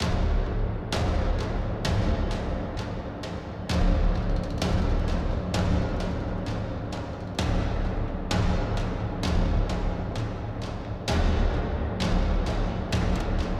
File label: Loop Perc; children of morta; Procedural Events